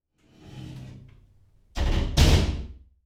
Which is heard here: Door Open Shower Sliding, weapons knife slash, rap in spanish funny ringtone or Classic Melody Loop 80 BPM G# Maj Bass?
Door Open Shower Sliding